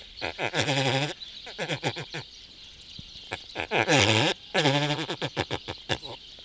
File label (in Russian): golos drofy